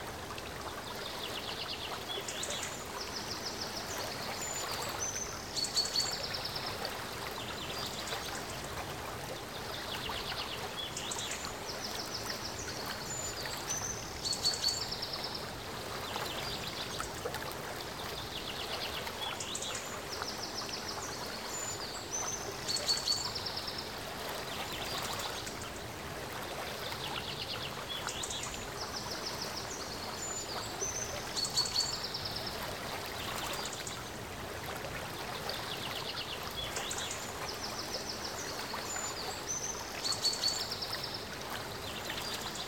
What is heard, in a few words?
SCOT WOOD 2 NO CAR EVE; The 39 Steps